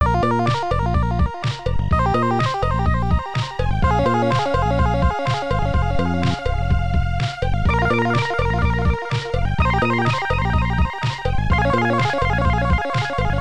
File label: Level Complete; qix amiga